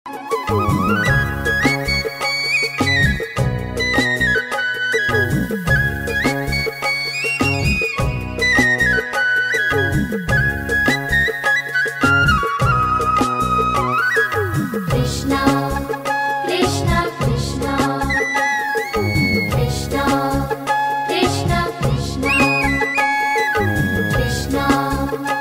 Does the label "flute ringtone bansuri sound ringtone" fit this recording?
Yes